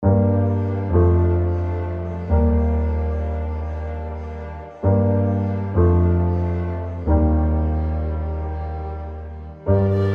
Broken Ambient Keys; ASCEND; Gravity Methan Oceans

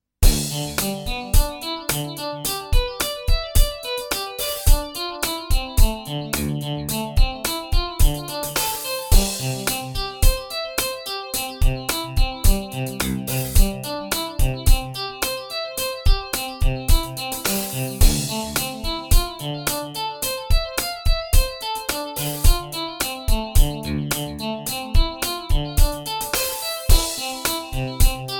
Swell GTR

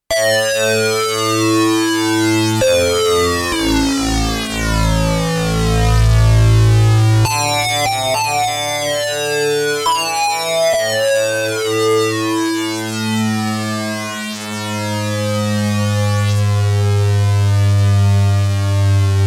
Sh-4d Model Sync Sweep Lead1

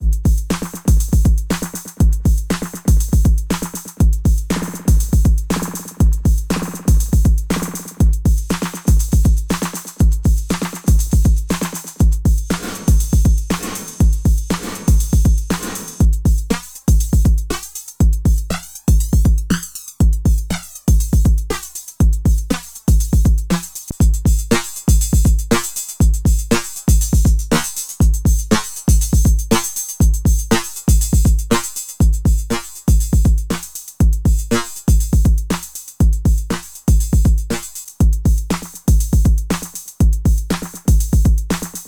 Roland TR-06 Simple Beat Delay Types; Boutique

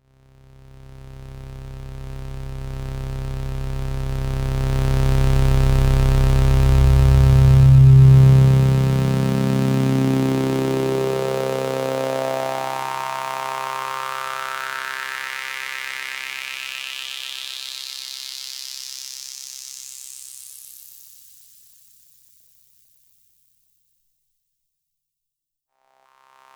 doepfer a 121 a 138j

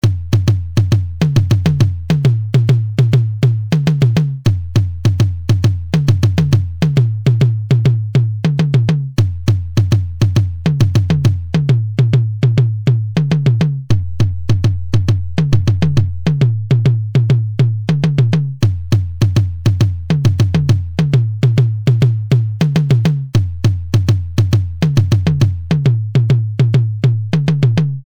Drum Tom filter

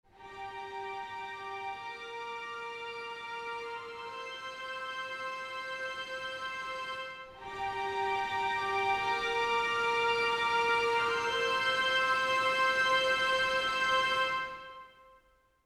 Full Ens Harmonics; Audio Imperia Areia

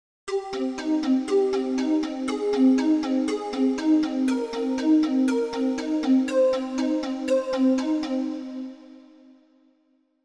Korg M1 Flute Hall

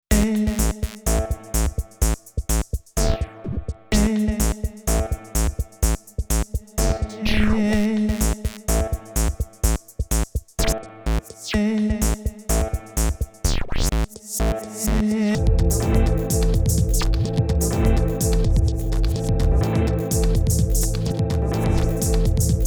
Preset Pattern

